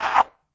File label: bird collision low